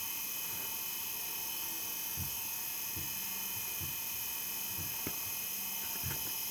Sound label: ibm travelstar 20gn ibm djsa 210 running